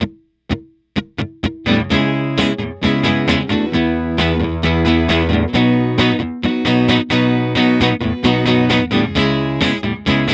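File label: amped Acoustic guitar; FatHead; RIBBON MICS are great for rejection - these were 5-feet from the drums!!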